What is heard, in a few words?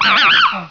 headcrab attack; svencoop